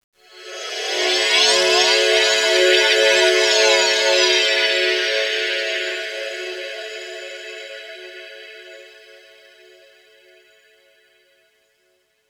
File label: Noize FM Pad, Underground UK House & Electro, Pads; Sonic Foundry (Sony Creative Software)